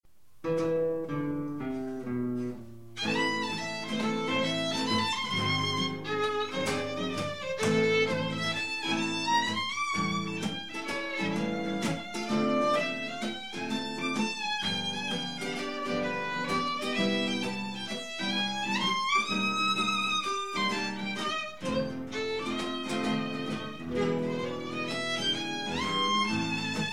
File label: Begin violin solo